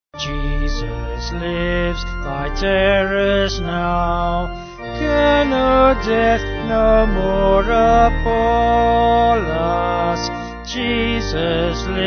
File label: Jesus Lives, Vocals Organ; St Albinus